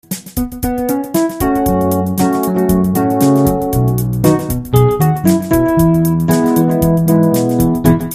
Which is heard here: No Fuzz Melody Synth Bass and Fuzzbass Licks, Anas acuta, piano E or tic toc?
piano E